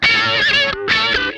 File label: RASP CRAZY, Funk Guitar; 90 s Sample CDs; Zero G